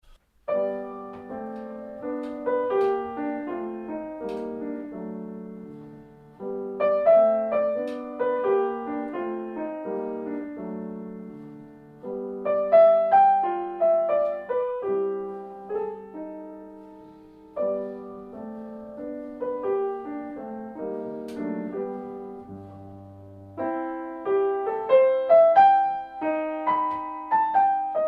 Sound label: Blues